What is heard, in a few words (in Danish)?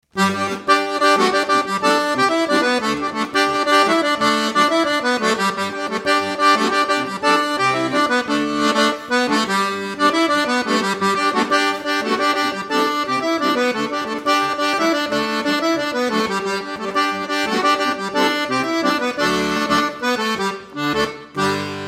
harmonika